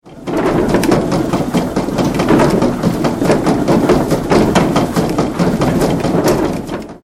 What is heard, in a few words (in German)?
Müller Rüttelschuh